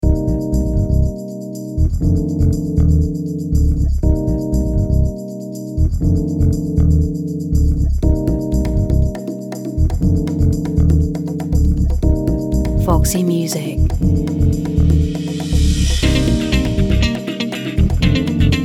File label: Funky; Foximusic